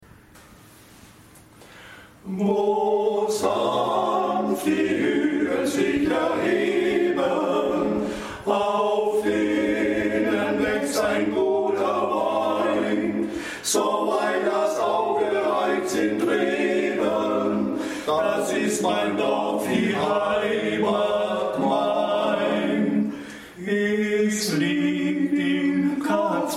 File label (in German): gesungen